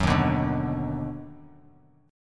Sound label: Eb Minor Major 7th Sharp 5th Eb down Bass Standard, chord; EbmM7#5; strummed; JGuitar